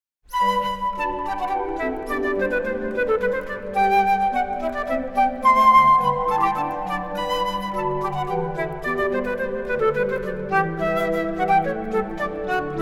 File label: hornpipe